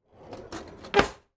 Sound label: household kitchen utensil drawer close